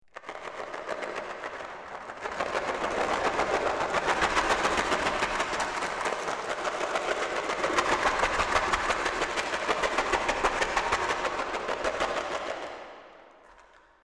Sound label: cash